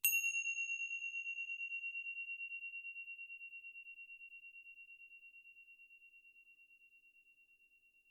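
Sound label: tibetan cymbal close sound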